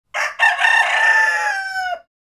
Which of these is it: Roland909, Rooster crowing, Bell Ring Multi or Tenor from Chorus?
Rooster crowing